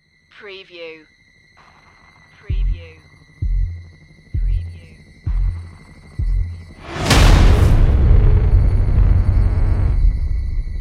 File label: horror trailer